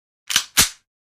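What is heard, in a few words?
Handgun 9 mm Cock Single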